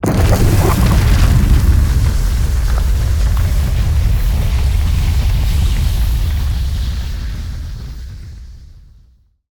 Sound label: Mortar Cannon Flame Puddle; Evolve; Behemoth